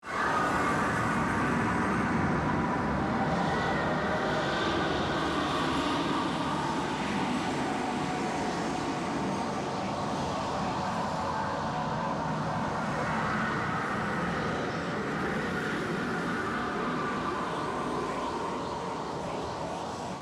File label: Sci fi ambience loopable astronaut space